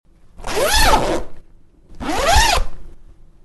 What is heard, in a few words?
Zipper Zipping